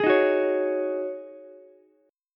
C sharp Suspended 2nd Suspended 4th, C sharp, Ukulele; strummed; JGuitar